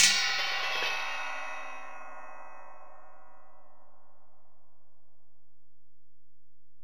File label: Ride, Alesis DM-PRO, Drum Machines